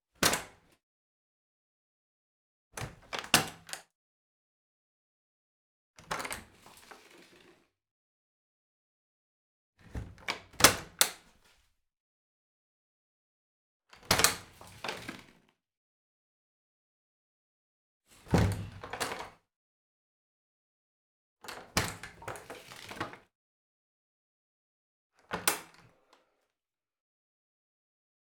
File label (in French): PORTE CHAMBRE BOIS SERRURE OUVERTURE FERMETURE